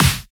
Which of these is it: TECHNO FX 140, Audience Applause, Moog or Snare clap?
Snare clap